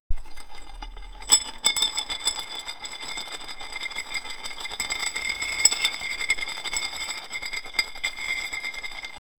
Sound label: spin a bottle, twirl